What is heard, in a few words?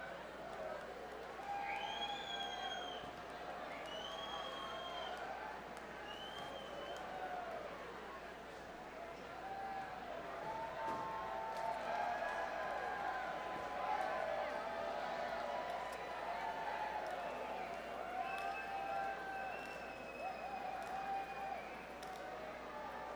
Encore Break Crowd